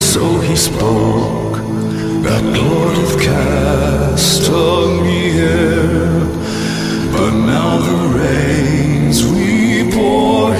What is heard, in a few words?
Rock